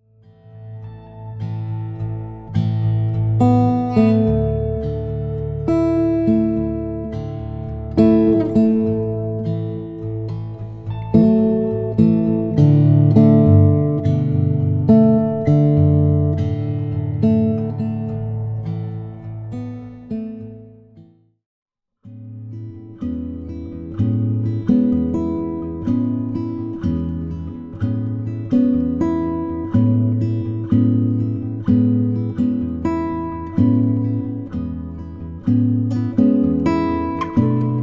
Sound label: Soundtrack Warm Up Cool Down